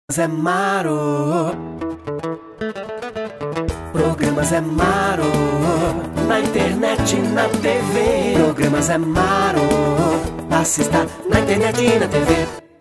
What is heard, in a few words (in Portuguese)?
Vinheta Cantada